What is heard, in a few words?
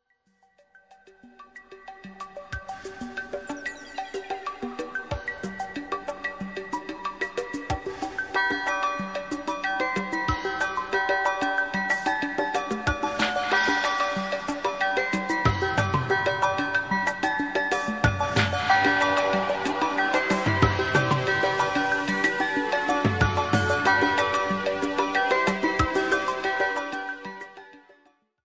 Cheerful Ringtone